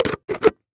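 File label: handheld vacuum cleaner sounds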